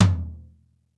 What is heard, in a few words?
RUDE TOM M; R8M TOMS; East West; Global Sounds